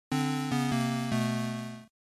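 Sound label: Game Boy Action Game Over, bgm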